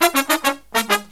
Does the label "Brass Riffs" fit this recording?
Yes